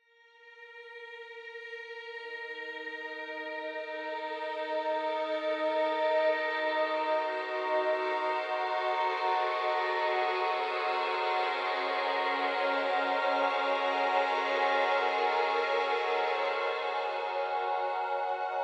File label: string psychosis